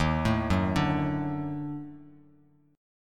D sharp Minor Major 7th Flat 5th D sharp Bass Standard; arpeggiated